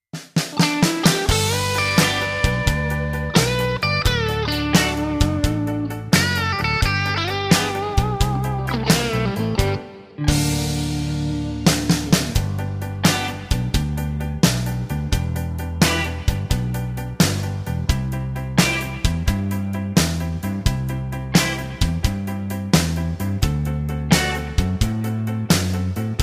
G No Backing Vocals, backing tracks

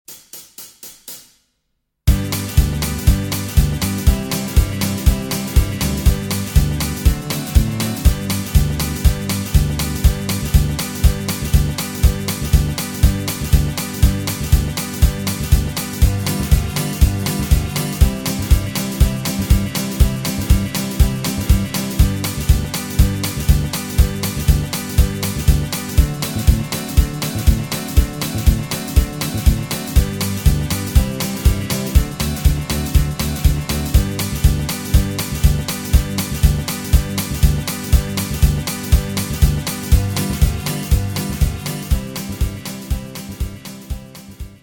G No Lead Guitar, backing tracks

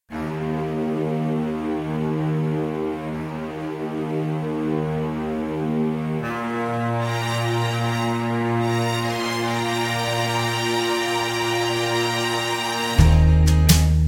(Eb) No Backing Vocals

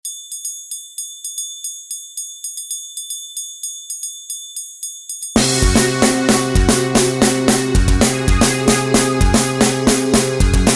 Instrumental (C)

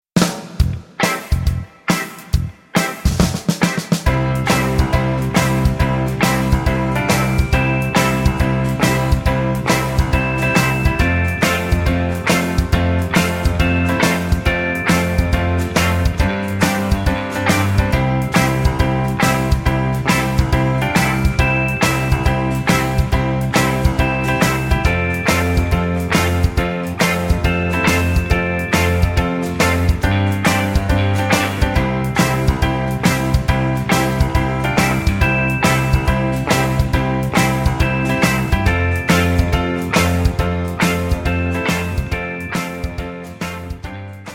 C No Backing Vocals, backing tracks

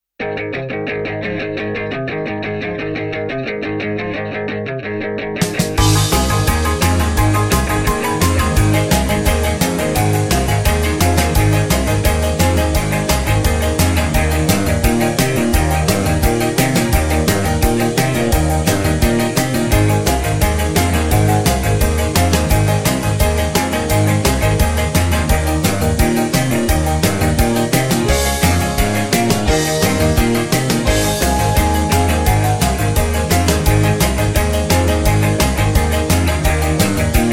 Ab No Backing Vocals, backing tracks

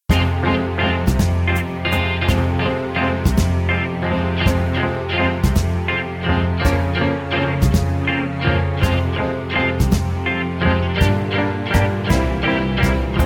(F) No Backing Vocals

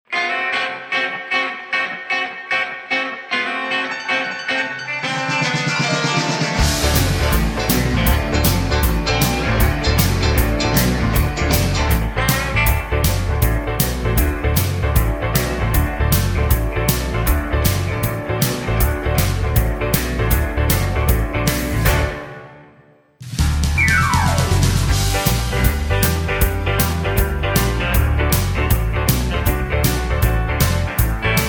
Rockabilly, No Backing Vocals

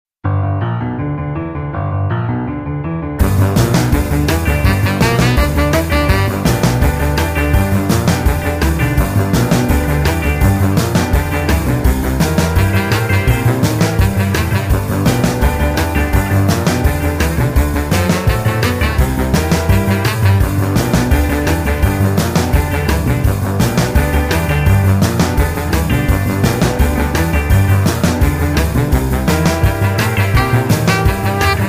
(G) No Backing Vocals

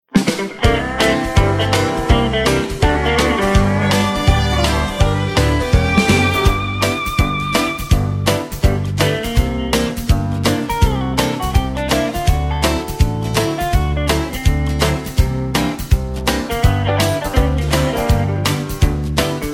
G No Backing Vocals, backing tracks

